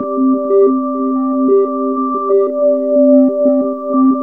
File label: soft fifths, soft pads; Zero-G Ambient 1